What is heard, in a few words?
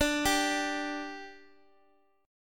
G 5th D, chord